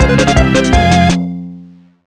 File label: Next Level Music; Spookware